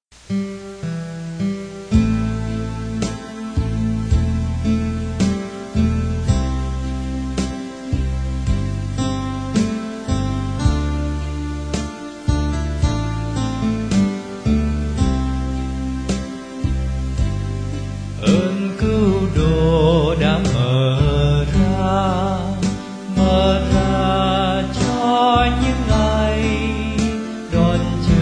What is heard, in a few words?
alto